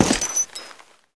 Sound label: step; HL2 combine